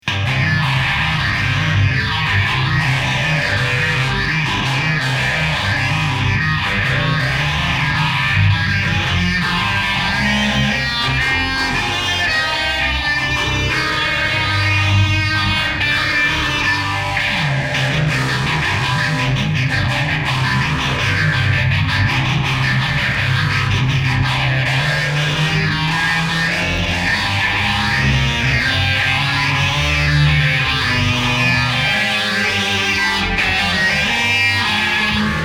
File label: Swamp Stomp Solo